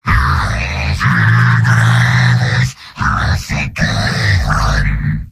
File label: singing; heavy; source engine; tf; mvm